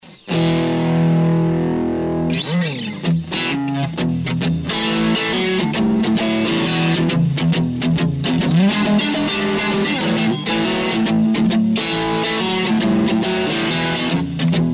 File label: vintage; Vox Tonelab LE